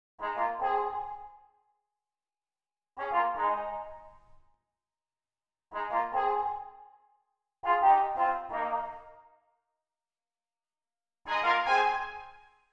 Brasses

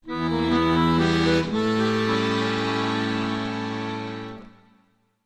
Accordion Bridge

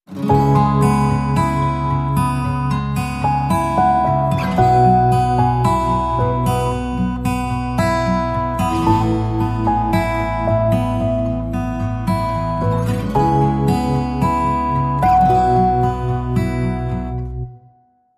Calm And Serene, No Drums

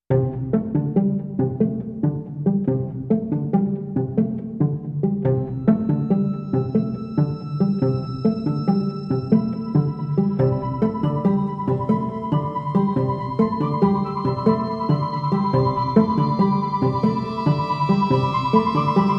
Water Sculpture No Perc

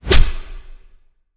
Birdie Bliss Whoosh

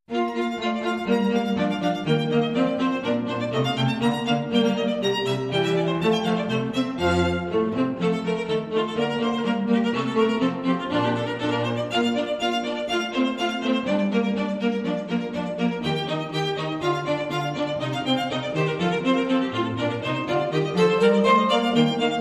Vivace, Strings Only

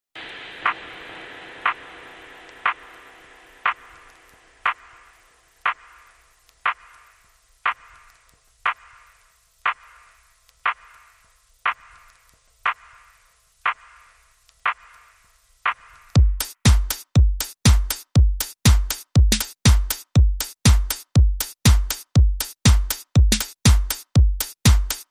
Beat

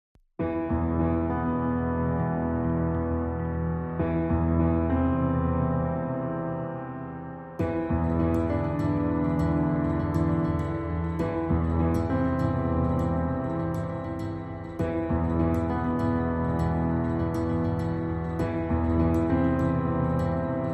No Drums Reduced Arrangement

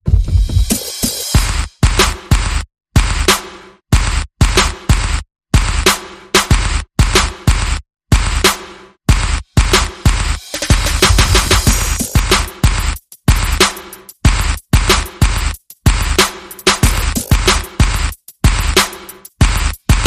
Perc and FX Only

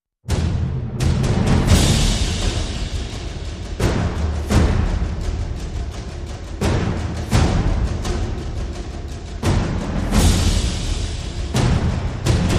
Percussion Only

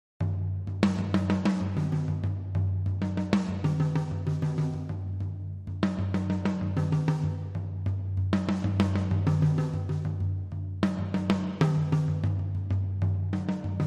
Toms Groove

